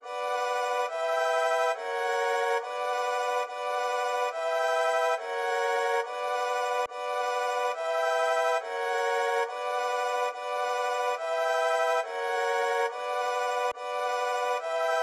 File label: 140 BPM B Min Strings High; Melody; Loop Stems; Cymatics; 2022 Melody Collection; Drill; Sacrifice